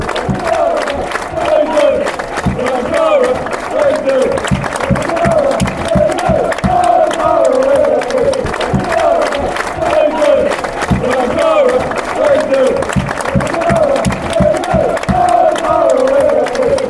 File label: we love you boro, fan chants; stevenage